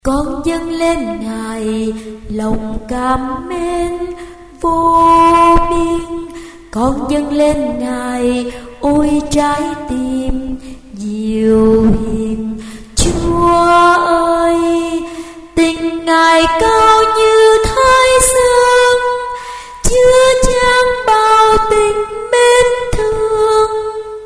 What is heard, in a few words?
sop alto